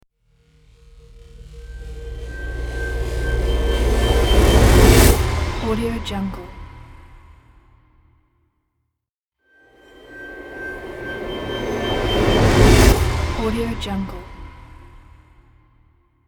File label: rise swoosh transition